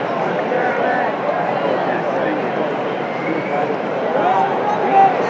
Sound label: Crowd